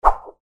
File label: menu; actmod